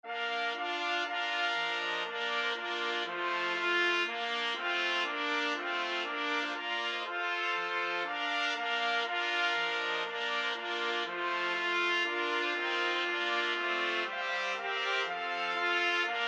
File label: clarke trumpet tune trumpet quartet